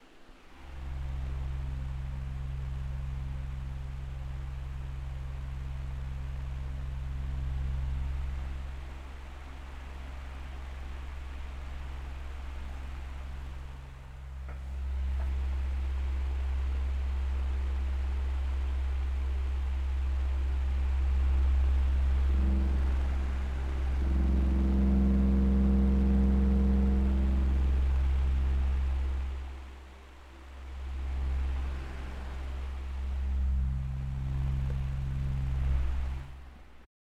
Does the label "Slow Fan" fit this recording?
Yes